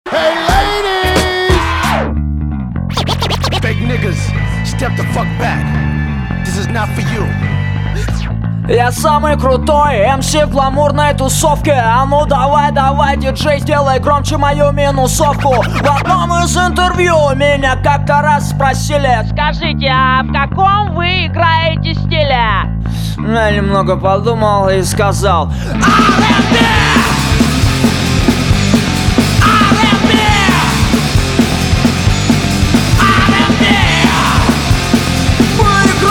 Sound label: rnb